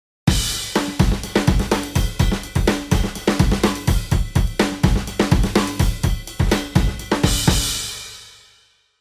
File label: Drums; labs drums